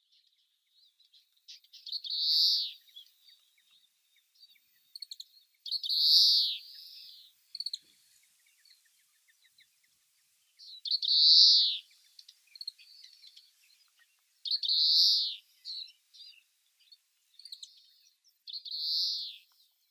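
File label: Oriental Greenfinch; mishima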